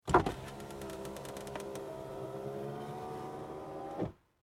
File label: Vehicle Auto Window Down Automatic Power Automobile Car; Cars; SFX Bible 6